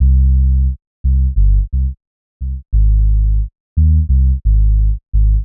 boom bap bass loop 88bpm c minor

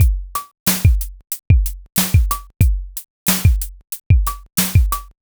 rnb drum loop 92bpm

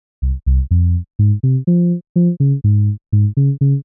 house bass loop 124bpm f minor

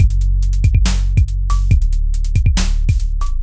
trap beat bass groove 140bpm

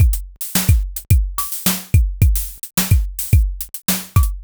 k pop drum loop 108bpm

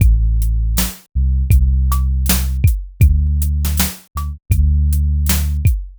lofi beat bass groove 80bpm

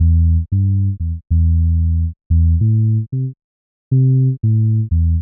rnb bass loop 92bpm e minor

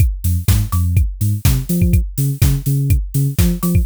house beat bass groove 124bpm

